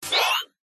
science fiction robot hurt pain chirp cyberpunk; zapsplat

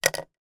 office pen 4 colour ball point down on table

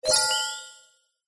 science fiction computer activate tone

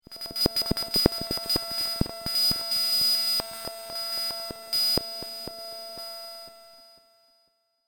sound design receiver delayed pips; zapsplat

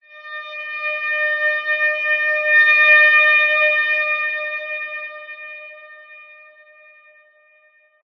transition passby

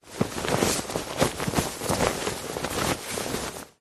clothing shirt movement rustle; zapsplat

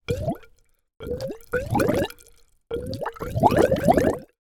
water gurgle in pipe air tube short

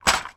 game connect 4 game in box sharp movement

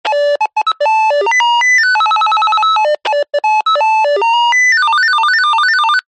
cb radio call ring tone random

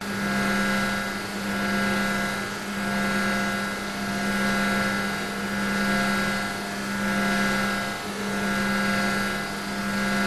sci-fi pulse room ambience loop; the sound pack tree

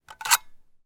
gun mossberg; still north media

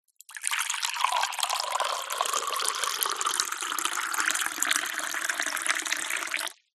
boiling water pour from kettle into cup med speed; food drink; zapsplat

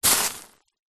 horror acid burn; zapsplat

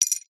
foley bottle beer lid cap metal drop on concrete

